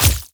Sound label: Sword Impact Flesh; Weapon Impacts; Player; Swords; Short Sword; Combat; Local Player